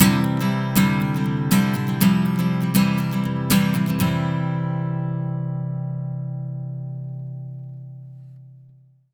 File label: 120 MAJ 2 CHD C, Acoustic Guitar Loops; Electric & Acoustic Guitar Loops; CHORDS; USB Soundscan vol.04; AKAI